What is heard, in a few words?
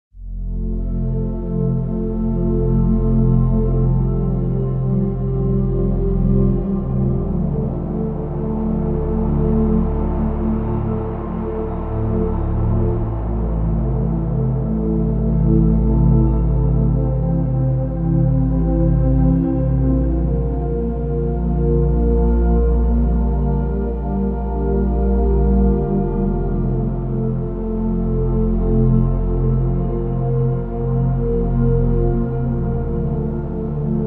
Delta Waves sleep music